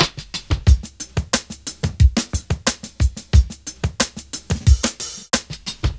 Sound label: Loop